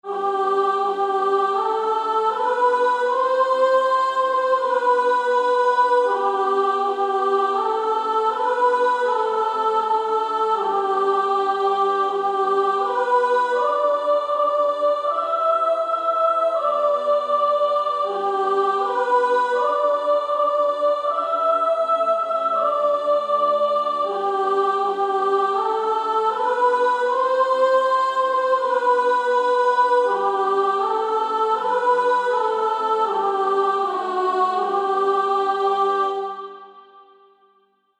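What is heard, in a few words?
Female Choir, soprano 2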